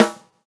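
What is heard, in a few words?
snare, Roland MV8800 drum kit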